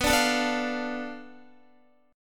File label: B Minor 7th Flat 5th, Guitar; strummed; 4E,4A,5D,5G,5C,6F